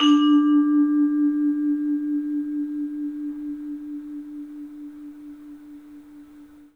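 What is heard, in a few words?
tubular bell; 3D Sound; 3D Keys VOL-1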